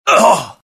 death, pain; mohaa; dialogue

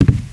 player grate; xash3d fwgs

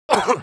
damage, pain; mohaa; dialogue

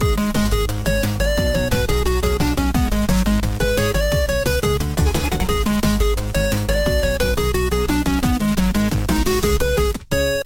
galactic groove; singe; spacerocks

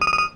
prompt; singe; dragons lair classic

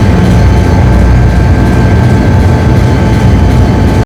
Sub Pen Sub Moving, vehicle; mohaa

